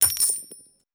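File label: Button Award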